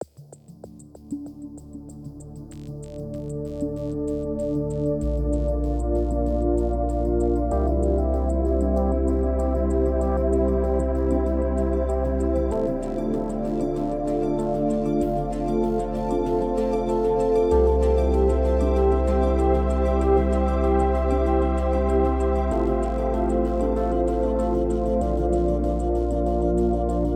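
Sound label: Music Electronic Post Race 96bpm; Music Elec 1#3 (Pm Elec Post Race 1 96bpm Game Mix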